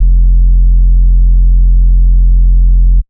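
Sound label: bass sustain subber F; Polyend; ARC Noise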